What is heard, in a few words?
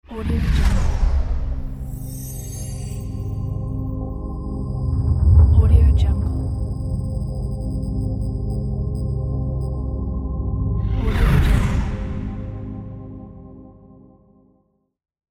tech company logo